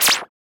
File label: beam; ショット音; ■効果音; たまねぎとくちびるウォーズ; ちびまる子ちゃん